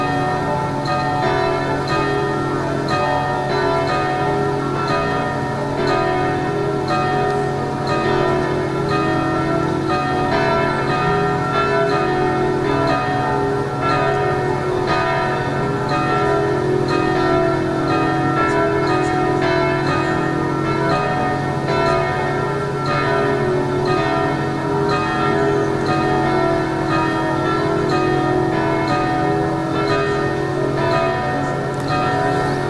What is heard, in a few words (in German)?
Glockenweihe Stadtplenumsläuten